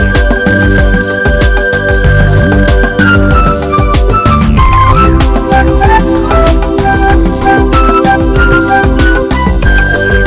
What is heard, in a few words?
Pretty and Soft Pop